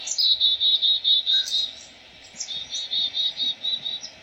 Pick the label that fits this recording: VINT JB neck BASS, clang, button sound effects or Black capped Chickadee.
Black capped Chickadee